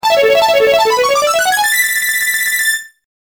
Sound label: Violin; Go-Rock Squad; Ranger